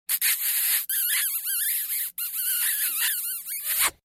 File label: Smooch Kissing